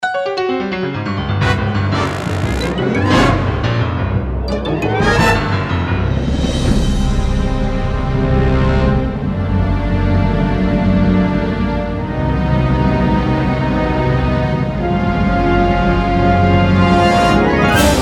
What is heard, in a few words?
Orch Score; TomCapek